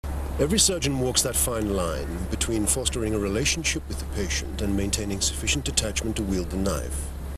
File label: knife; holby city 3.2